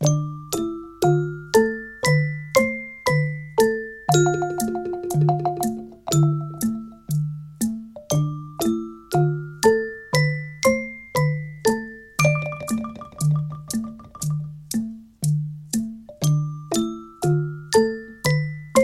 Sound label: Haunting Whistle Song